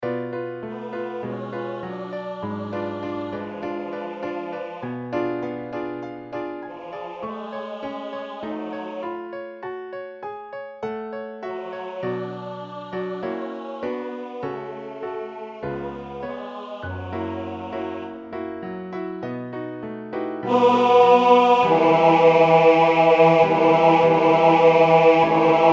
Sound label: Bass